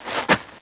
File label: CROUCH TO PRONE